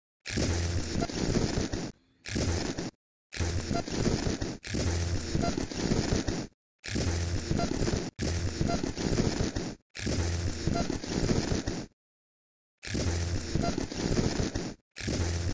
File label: splaat slap; aleks basics chapter 9